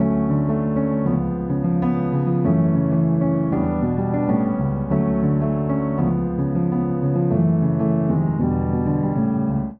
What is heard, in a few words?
Progression Soulful Vamping Imaj7-vii7-iii min7-iimin7-V7 D Major 98 bpm, Upright Piano; MIDI Clips; Ableton; Factory Packs; Spitfire Audio